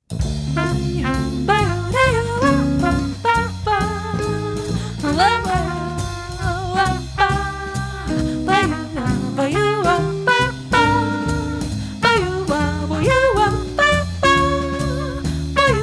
BLUES

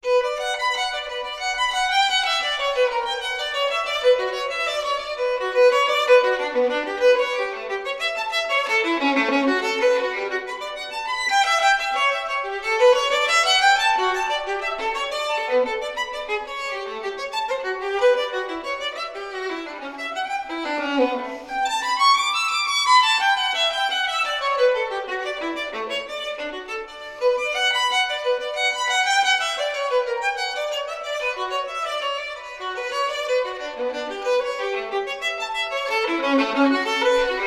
Partita No. 1 in B minor Double; BWV 1002

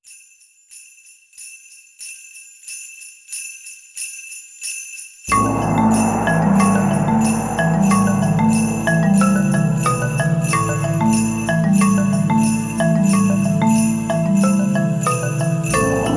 Double Drums Mongolian Sleighride

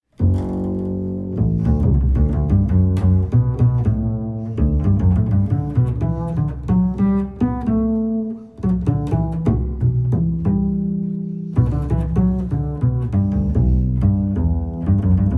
Intensity of Bass

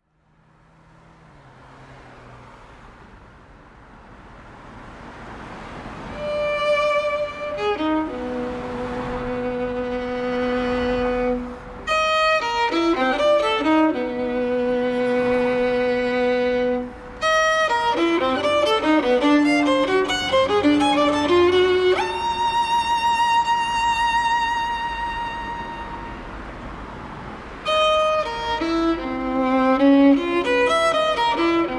Tango

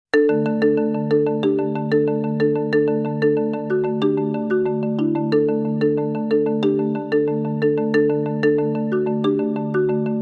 Double Drums, Grooving Christmas; Morgen Kinder wird's was geben

